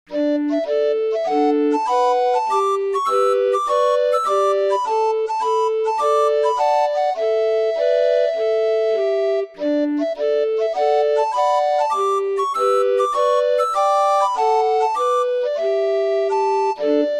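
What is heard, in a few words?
Humoresque, orgue de barbarie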